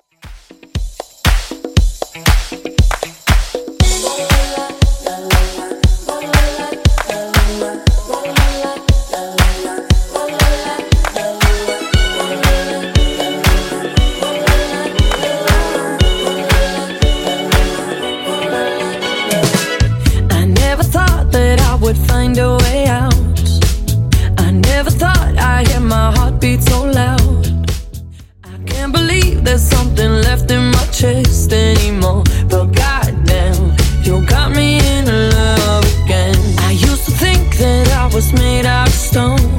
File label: Top40 Extended 118 bpm; remixplanet